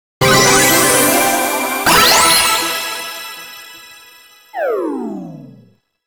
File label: IDOL RANKUP